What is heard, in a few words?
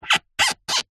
string rub wood squeak; foley